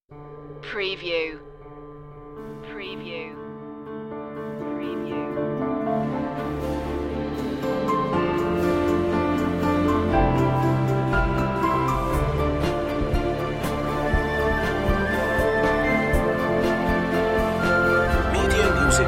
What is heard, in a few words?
winter glow